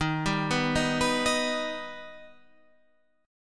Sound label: D sharp Augmented D sharp, chord